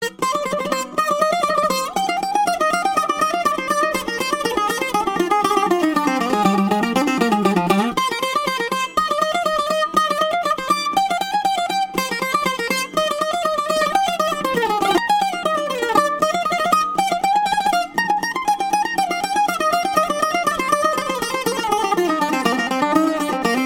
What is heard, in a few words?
greek mix, dance